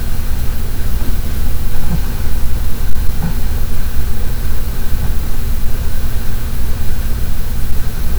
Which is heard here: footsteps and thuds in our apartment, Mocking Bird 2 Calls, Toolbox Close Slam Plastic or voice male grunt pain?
footsteps and thuds in our apartment